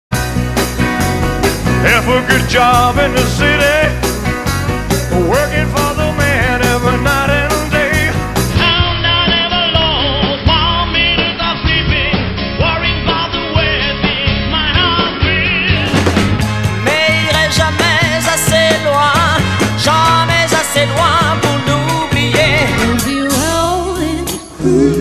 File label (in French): Chorale